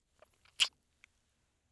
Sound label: Kiss